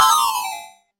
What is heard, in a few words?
stun stars, status effects; freepixel